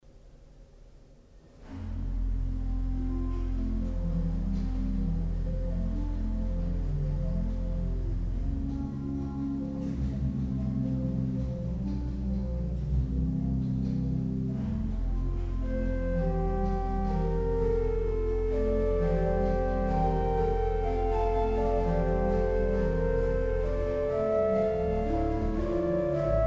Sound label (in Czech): varhany; litomerice